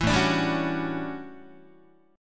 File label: A 13th A down Guitar; strummed